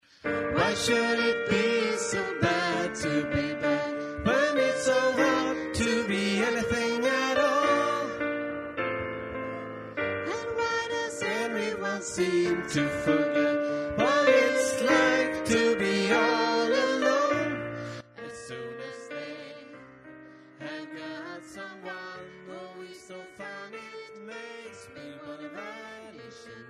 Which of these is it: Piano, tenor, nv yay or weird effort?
tenor